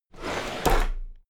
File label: drawer cabinet equipment open heavy